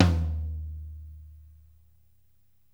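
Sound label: floor tom; DRY KIT; Spectrasonics; Burning Grooves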